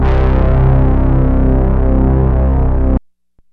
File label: BIG SYNC, OBERHEIM; Rubber Chicken Software Sample Bank